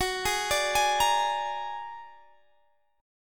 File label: A sharp Augmented 7th, chord